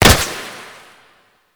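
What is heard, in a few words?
Flak Cannon Projectile Hit; Warframe; Grineer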